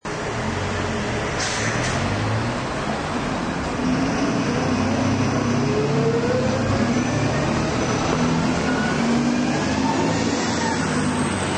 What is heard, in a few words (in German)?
bus